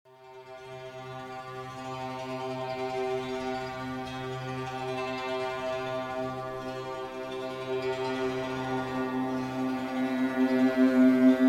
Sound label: Deep Relaxation